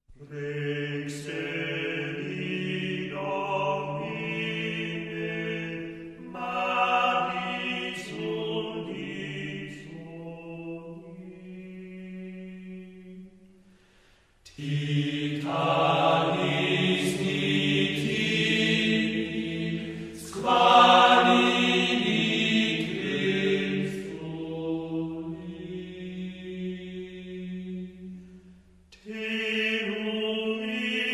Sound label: parallel organum